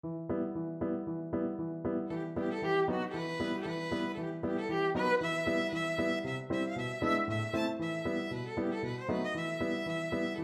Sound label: schubert moment musical 3 violin